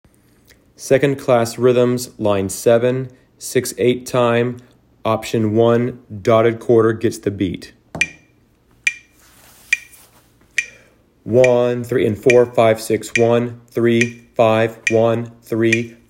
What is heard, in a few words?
rhythms dotted quarter